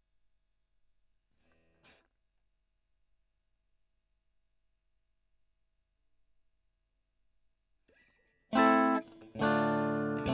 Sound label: E. GTR Gefell